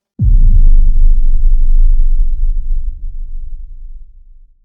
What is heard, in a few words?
deep loud thud sound